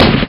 TOM

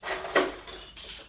Ambient Kitchen; Restaurant; Act Kitchen; Ambient Kitchen Oneshot

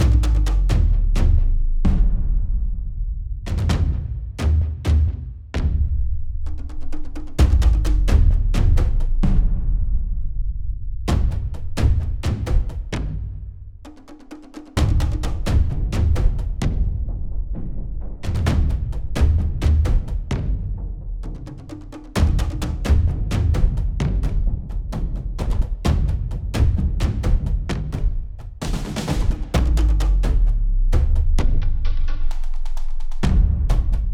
Perc Wood Lgt Lp; unruly heroes

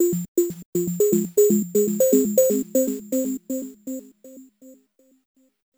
Overlay Tone; Act2 Rezoning

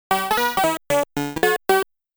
Round Clear Music

